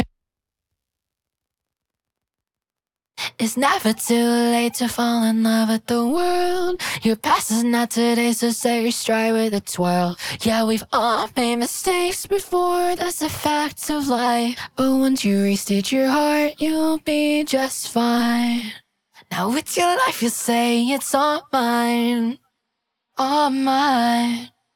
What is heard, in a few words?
motif voc raw